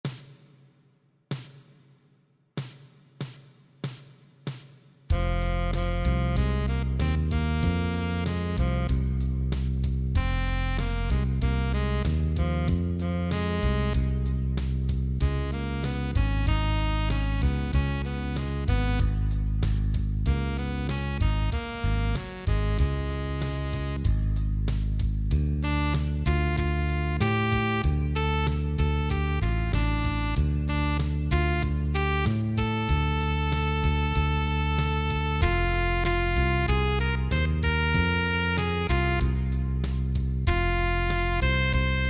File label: play along piano